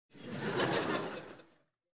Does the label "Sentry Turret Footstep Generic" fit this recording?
No